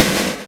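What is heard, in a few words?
snare processed, Disco House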